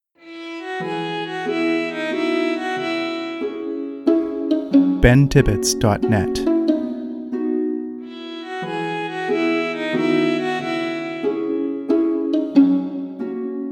waltz; pearisburg

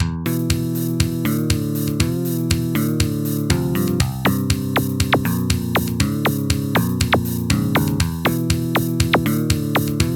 hover, chiptunes

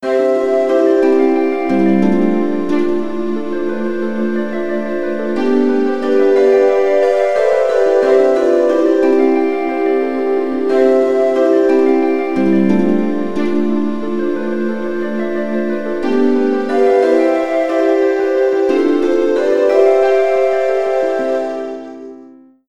lullaby, chiptunes

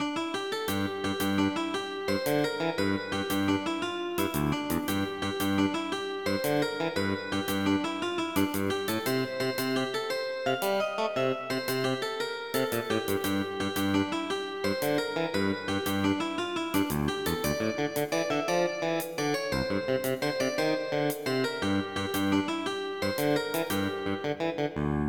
blues, chiptunes

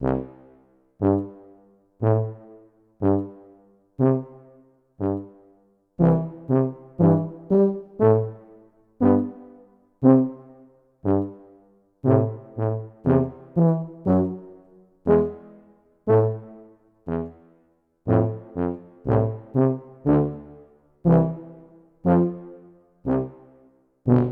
tuba duet

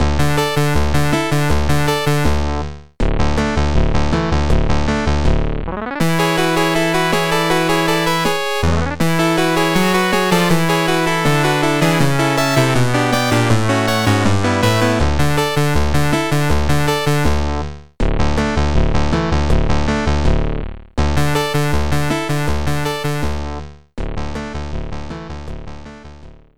game room, chiptunes

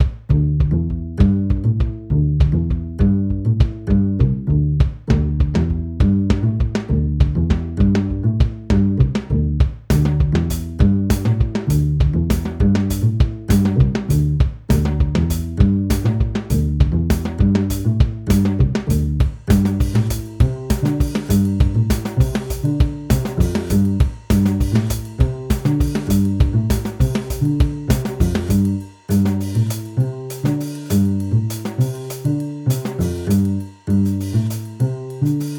drums and bass

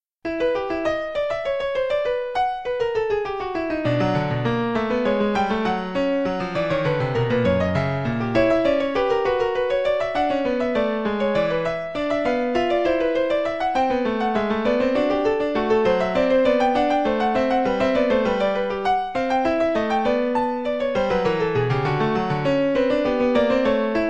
fugue in e minor; juvenilia

